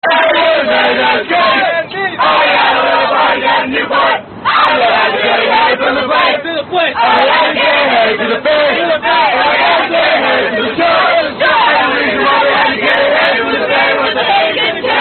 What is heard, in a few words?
California Drinking Song; Bago Ucla 07